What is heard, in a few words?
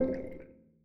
txting type extra; Steam; tenfoot